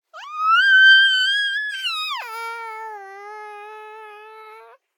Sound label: BABIES SHOUT Girl 12 months High and Long; Baby Boom